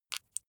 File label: flesh wet greasy squish single